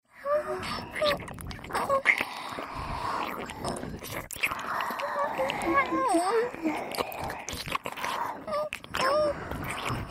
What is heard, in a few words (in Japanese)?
ディープキス＋両耳舐めループ（通常ver）